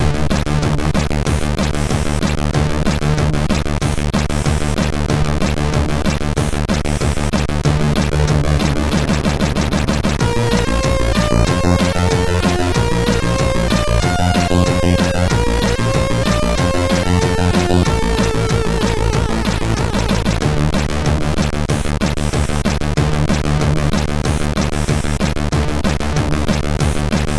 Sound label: sid MOS6581R2